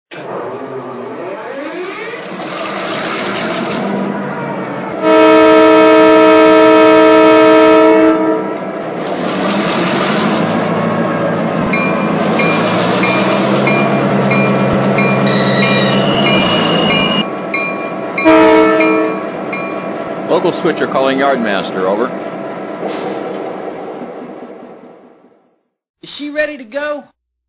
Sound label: MP15DC; AMT